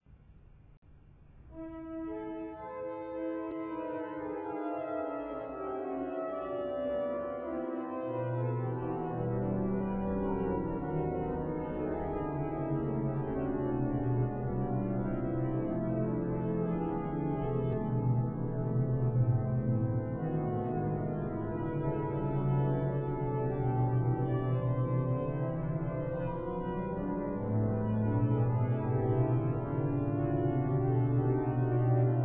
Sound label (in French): Fugue en la mineur; Glinka